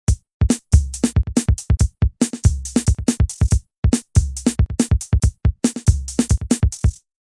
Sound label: drum pattern